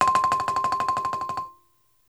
BALAFON, African Melodic; E-MU Producer Series Vol. 7 – Old World Instruments

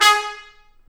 BRS TP, Roland, Stabs; Roland L-CDX-03

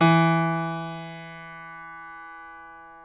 PNO Grand Pno; Club-50 - Foundations Roland

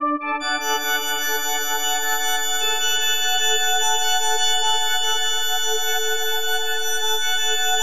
FM D MAJ; Bolder Sounds

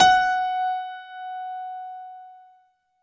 F#4 DANCE, ANALOG SEQ, Roland JV 2080

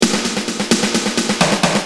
Hard, Drums Perc; Industrial

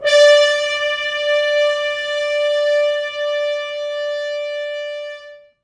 Horns stops

